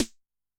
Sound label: S Ana, Snare; Yamaha DTXpress-II